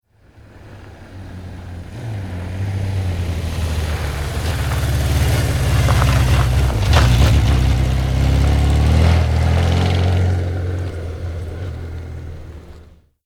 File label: Motorbike Suzuki 1100 Up and Pass Slow; Motorcycles